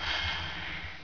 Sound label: Air, Train; OpenBVE